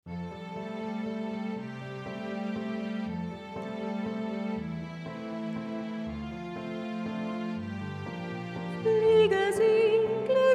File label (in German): Der Papierflieger Sopran; musical ag